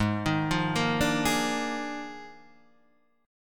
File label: Eb Major 7th Suspended 2nd Suspended 4th